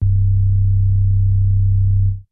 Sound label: SUB ORGAN, mono poly; TECHNO-BWARE